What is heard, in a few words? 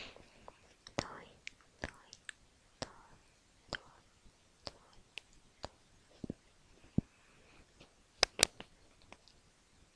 toy